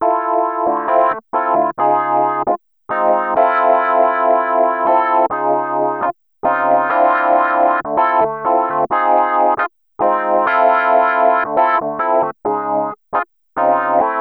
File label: EP, Electro Lounge; Sonic Foundry (Sony Creative Software); 135 Funky Air